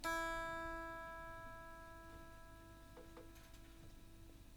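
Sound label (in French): Electrique mi-, guitare électrique stratocaster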